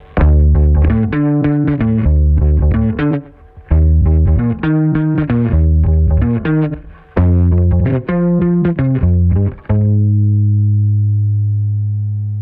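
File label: Bass VSC 3 4:1 Ratio 30ms Attack Auto Fast Release 5 dB Gain Reduction Peak Mono Sidechain Filter 90Hz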